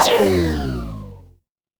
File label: retro game laser